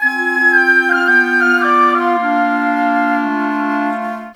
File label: Winds, Orchestral, Rock Pop; Sonic Foundry (Sony Creative Software); Orchestral Series VOL-4 - Rock and Pop